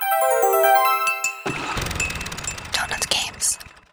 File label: Loading; Sunday Lawn